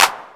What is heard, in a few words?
clap, drum samples